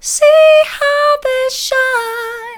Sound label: see how they shine soft, vocal